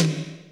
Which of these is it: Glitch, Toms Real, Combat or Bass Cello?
Toms Real